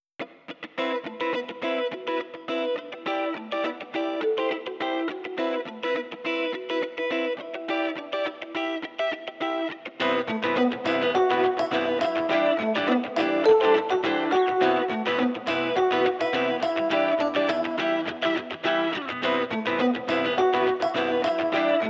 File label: no bass no drums